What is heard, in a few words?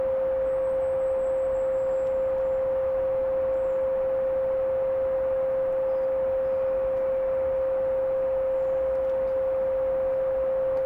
528Hz; Sound of miracles